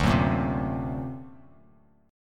D Augmented 7th Bass Standard, chord; D+7 strummed; JGuitar

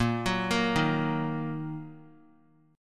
A sharp Suspended 4th Sharp 5th down; arpeggiated; A#sus4#5; JGuitar

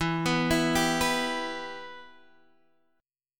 E-Minor, Guitar, Drop D; x,7,9,9,8,7

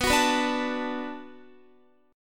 B Minor 7th B Guitar, chord; Bm7 strummed

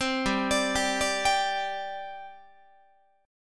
G Suspended 4th G down Guitar; arpeggiated; 4A,4E,5A,5D,5G#,6C#; 15,15,17,17,18,18

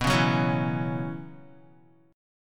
B Suspended 2nd Suspended 4th, Guitar; strummed; JGuitar

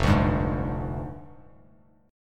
F Minor 6th Add 9th F down Bass Standard; JGuitar

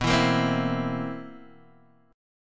C 7th B, C7/B; strummed; JGuitar

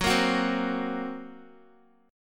Gb Major Flat 5th, down, Guitar; strummed; 4F,4A,5C,5G,5C,6E; JGuitar

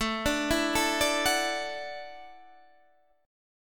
F sharp Minor 7th Sharp 5th A

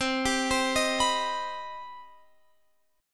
C Minor Major 7th C Guitar Open F; CmM7; arpeggiated

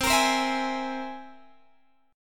C Augmented 7th, Guitar, Open G

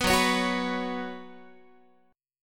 Gb Suspended 4th, Guitar; Gbsus4 strummed; 4A,4E,5A,5D,5G#,6C#; 14,14,16,16,15,x

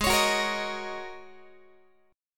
Ab 9th Suspended 4th Ab down Guitar; Ab9sus4 strummed; JGuitar

